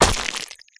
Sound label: destruction impact hit mixed surface; stone dirt